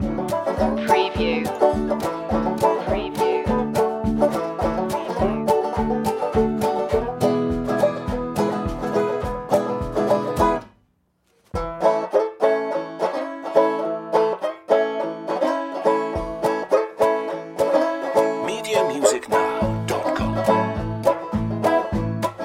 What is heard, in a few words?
country boy breakfast loop